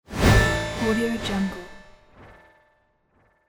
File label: hard metallic whoosh; floraphonic